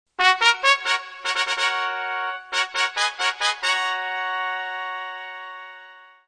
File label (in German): tusch